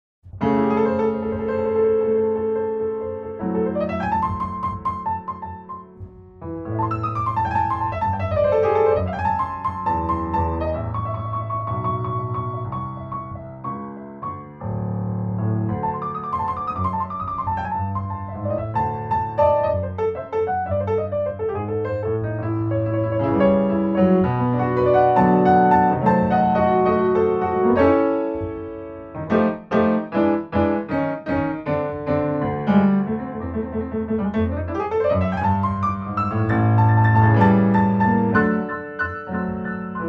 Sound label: blues